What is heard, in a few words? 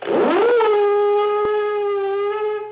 ship alarm